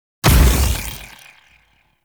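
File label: Blast Projectile Explosion; Warframe; Iron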